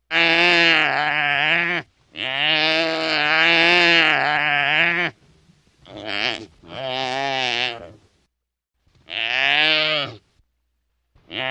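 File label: antelope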